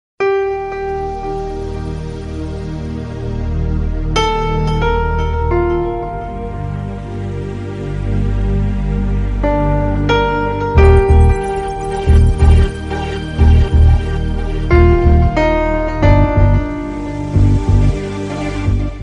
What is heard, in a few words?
soft romantic tone